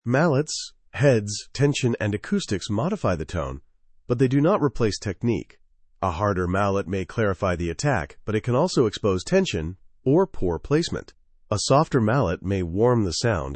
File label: timpani tone guided companion track, timpani stroke and tone